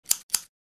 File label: Scissors blade open and close